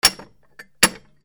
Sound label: KITCHEN